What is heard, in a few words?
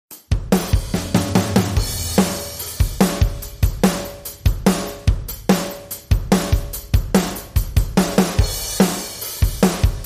Drums and Bass